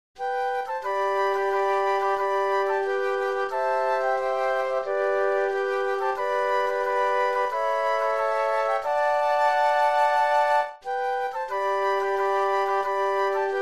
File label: Flute Quartet